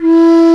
QU DI E4, QI-DI FLUTE; Spectrasonics; Heart of Asia

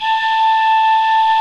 AFRICAN INST; OMI - Universe of Sounds

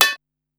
Pot Hit, Korg Wavestation-SR Drum Kit

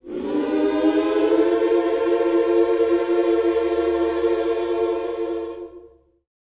MINOR, MIN.SCOOPS, Vocal Planet, Gospel

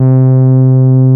Taurus, Moog Taurus; Greytsounds VOL-1

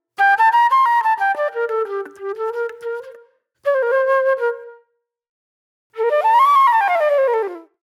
Reflective Flute; Sonic Foundry (Sony Creative Software); Manhattan Flutes

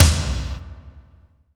toms, cinematic impact, drum

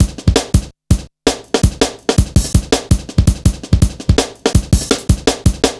165, Drum Loops, Drum & Bass; Total Drum&Bass

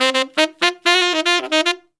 SAX SOLO; Zero-G; Phantom Horns

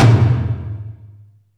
HALL FLR, TOMS; Back In Time Records; Big Bang

